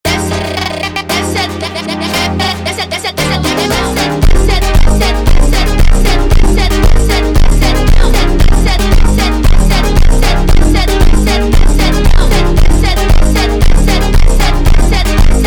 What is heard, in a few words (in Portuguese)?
slowed